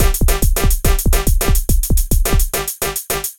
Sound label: break tweaking